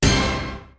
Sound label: orch hit